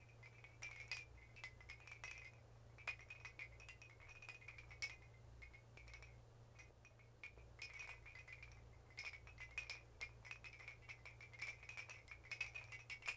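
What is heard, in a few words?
tusken Wind Chimes loop; Star Wars Obi Wan; XBOX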